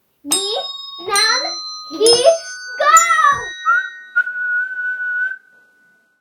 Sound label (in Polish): JINGIEL